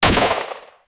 loud realistic firearm, shotgun

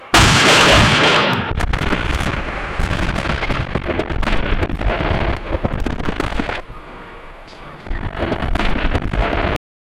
battlefield sounds war

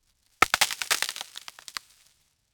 tree fall tree falling, crash

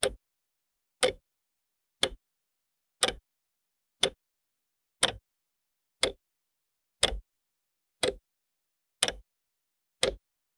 wall clock ticking sound; timekeeping

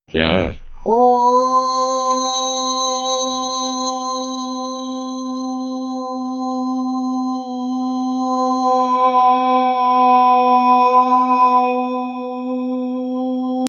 create a sound effects of, ahh

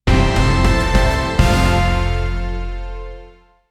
short epic mission comple, fanfare

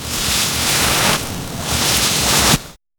sliding backward on wet grass

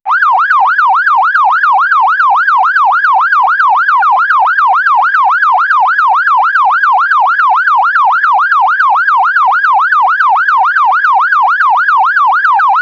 military base siren sound; emergency services

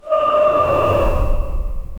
violent distorted scream

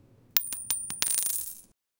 metallic clatter of coins

